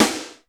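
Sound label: GATE snare, drum; Crypton; DrumBase5000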